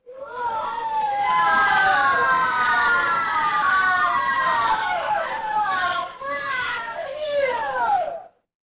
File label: crowd cheer